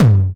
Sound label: Tom Mid Crunch, Drums